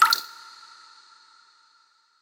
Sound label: drip